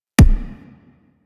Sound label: Weapon Punch RPG